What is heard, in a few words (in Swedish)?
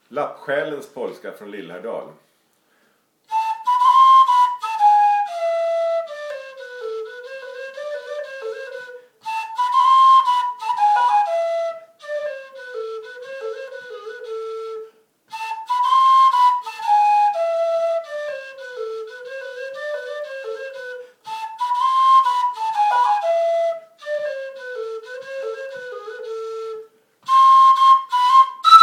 Lappsjalens polska, harjedalspipa